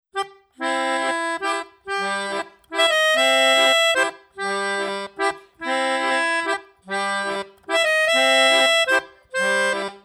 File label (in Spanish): melódica